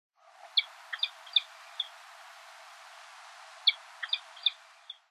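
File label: peregrine falcon, tottori; koe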